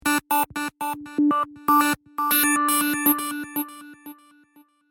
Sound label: Synth Fax